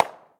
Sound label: bump; BlockHead; World Of Goo